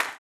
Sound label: CLAP, Blues Soul; Spectrasonics Vocal Planet